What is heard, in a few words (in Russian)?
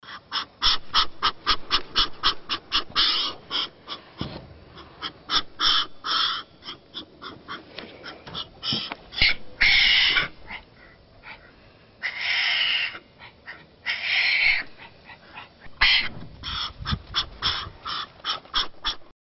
Vombat